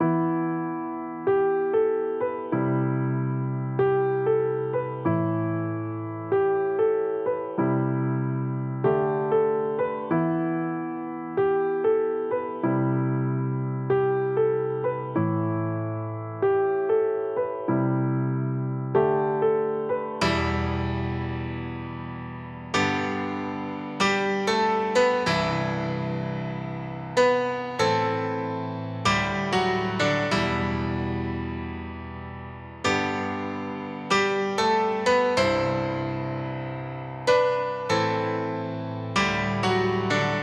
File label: piano